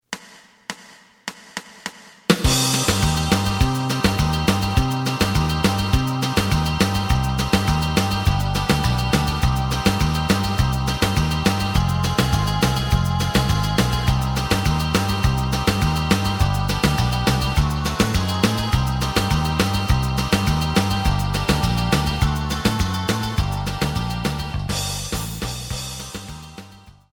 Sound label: lead